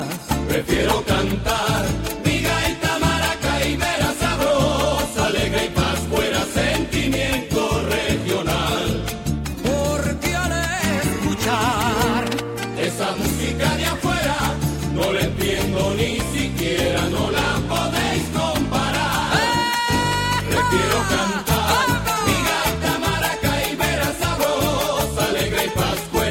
Gaita

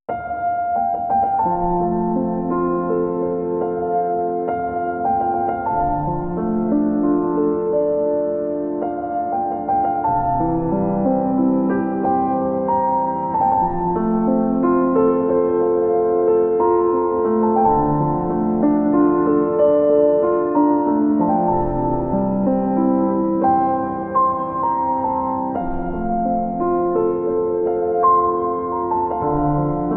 Hermoso Piano

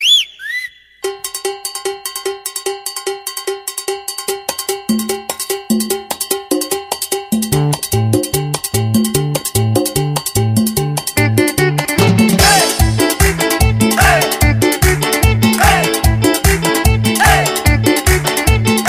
Cumbia

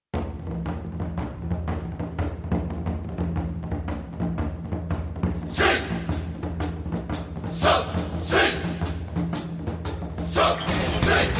Musica De Pelea

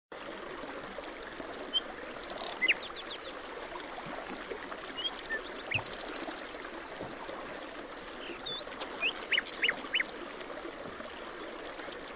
Pajaros

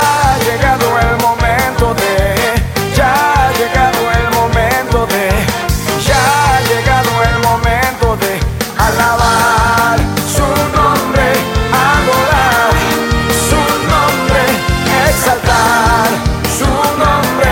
Alabansa Cristiana

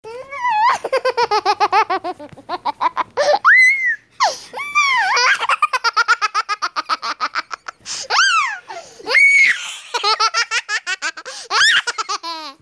RISA

